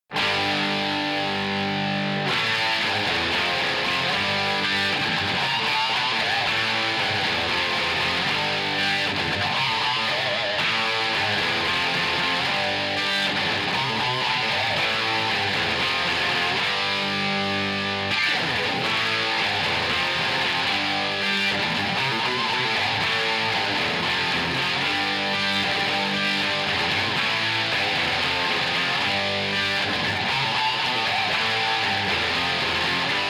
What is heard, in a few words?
guitar